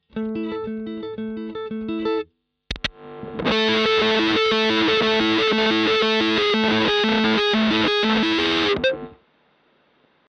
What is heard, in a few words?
BM20 ULTRA Gain max Some Riffs